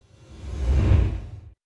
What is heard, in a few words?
change slide sound